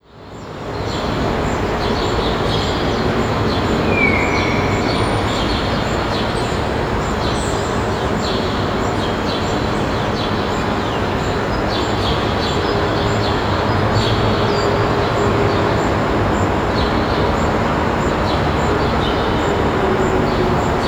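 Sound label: NYC Big Square, Town FX; USB Soundscan vol.02; Underground Hip Hop; AKAI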